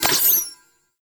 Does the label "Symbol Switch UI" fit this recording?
Yes